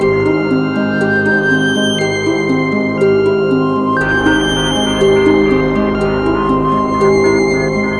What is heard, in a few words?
BGM BEFORE